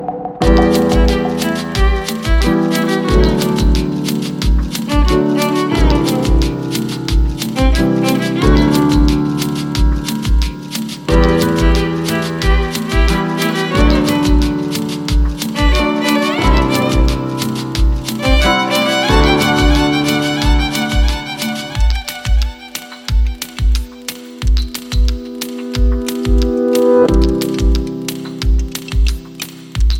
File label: Ringtone